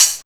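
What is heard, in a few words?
hi-hat; E-Lab; X Static Goldmine 1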